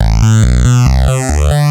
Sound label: TECHNO BASS 140 A